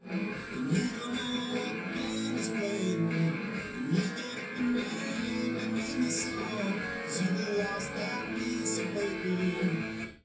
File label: wdrums 380ms 20cm, underdetermined; SiSEC11